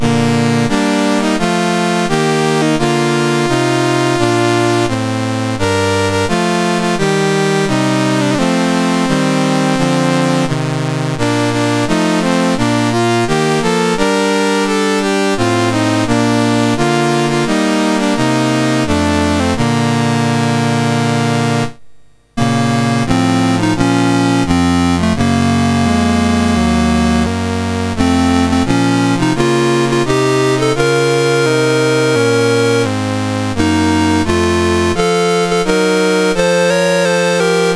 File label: MOS6581R4, National Anthems; Pakistan